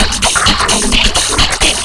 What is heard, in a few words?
DRM 130, House, LOOPS 130; Best Service ProSamples vol.10 - House; AKAI